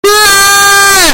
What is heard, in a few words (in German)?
hupe